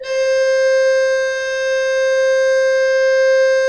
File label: HGRP C4, Cathedral Organ, HOLZGEDACKT; Propeller Island